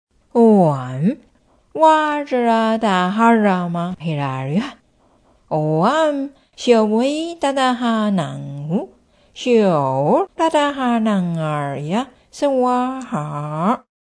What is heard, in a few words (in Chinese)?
大悲咒咒心 mantra heart; 十一面千手千眼觀音 - 大悲咒; 大悲心咒